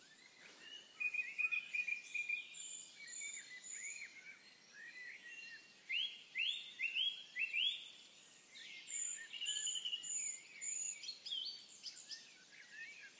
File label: Kerry Dawn Chorus